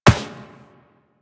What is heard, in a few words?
Walk Metal Perforated, Footsteps; Warframe; Operator